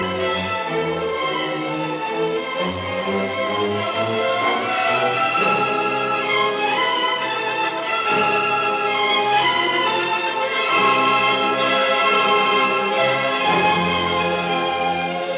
Prelude; Die Walkure; Act II; Scene 1

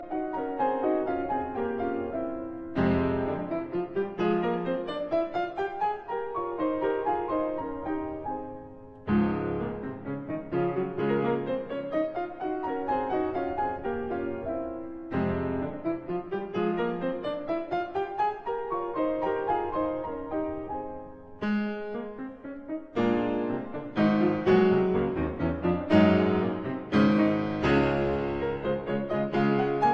Piano Sonata in F minor, Scherzo; Piano Sonata No 3; Op 14

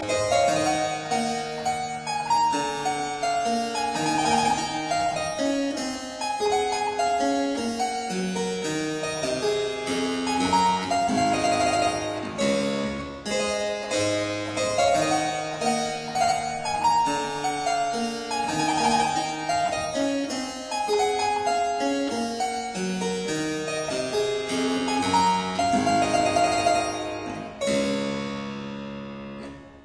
Suite in D major, Saraband; Locke; Melothesia; Arthur Haas

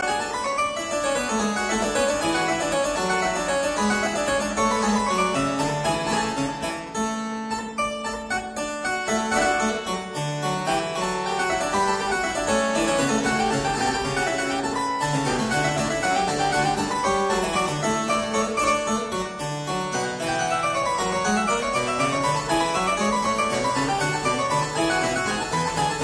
French Suite No 6 in E major BWV 817 VII Gigue

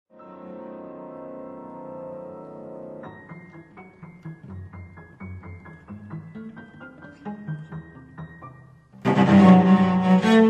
Sonata for cello piano Recitative

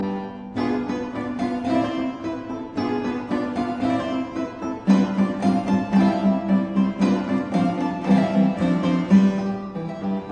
para clavicordio o piano forte Sonata in G major; Obras

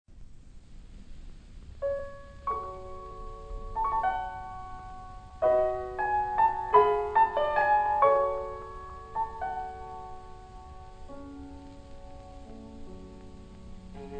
Piano Quintet in A Dumka Andante con moto; Dvorak; Op. 81

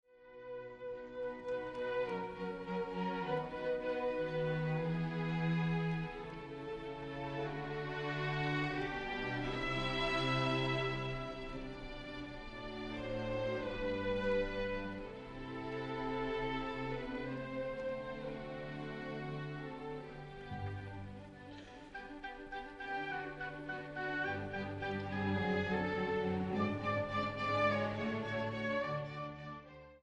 Piano Concerto in G major, Allegro moderato

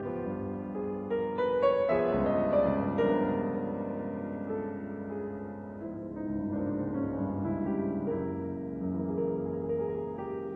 Piano Sonata in G major; No 6; Op 62

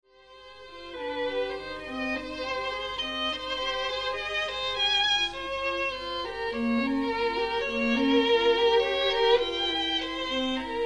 Fugue in F minor

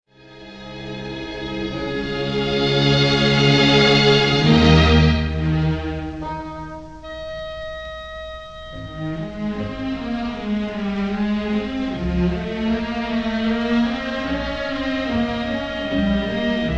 Symphony in C minor Andante con moto; Beethoven; Symphony No. 5; Op. 67

